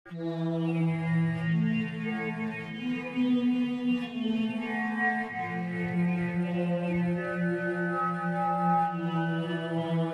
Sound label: Dark Sci-Fi Intro